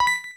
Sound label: Exclaim, battle anims; PokeRogue